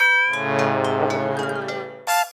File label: Stable - Sadness; Miitopia